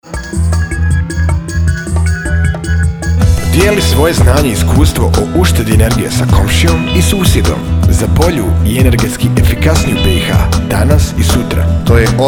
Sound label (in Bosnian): JINGL